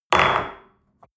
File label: espresso place on table hard